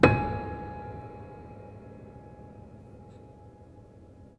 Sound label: Hamm Perc Ped, Piano Hammer Perc Ped